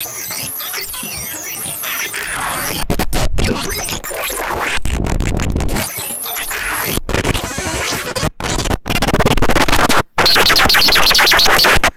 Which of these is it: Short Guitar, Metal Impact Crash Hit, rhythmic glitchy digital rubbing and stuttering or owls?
rhythmic glitchy digital rubbing and stuttering